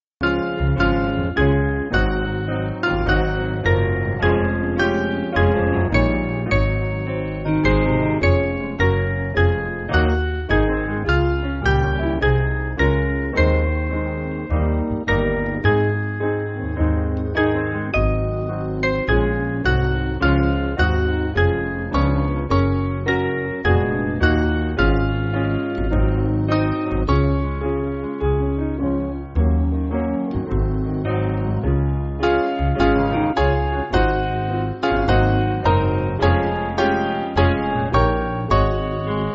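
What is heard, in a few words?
Piano, church backing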